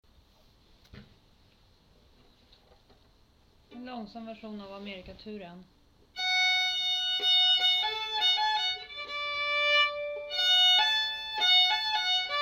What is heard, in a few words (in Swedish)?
amerikatur langsam